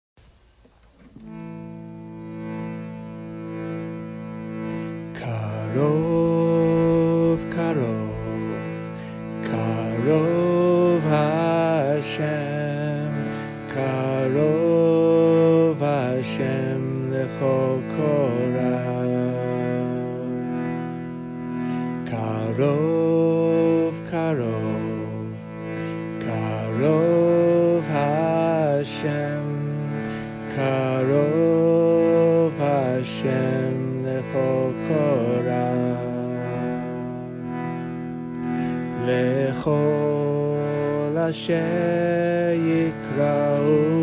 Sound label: koof chant